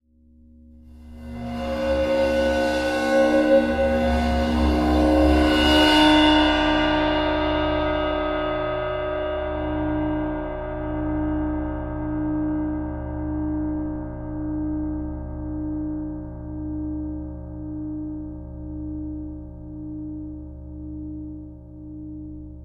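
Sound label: Old Ride Cymbol Bow close up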